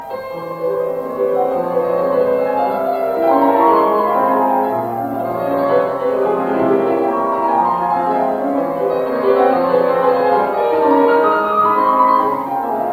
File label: chopin; 我现在做一次错误的踏板，很多人这么弹